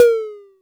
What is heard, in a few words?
Tom-Analog H, Korg Radias